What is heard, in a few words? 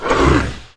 roar; The Elder Scrolls III - Morrowind